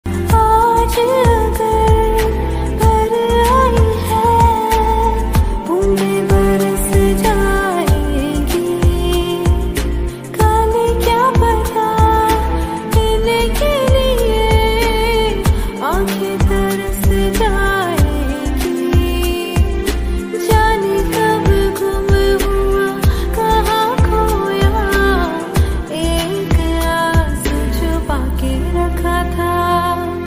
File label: Female Version Ringtone